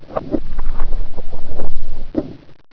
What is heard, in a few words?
alien door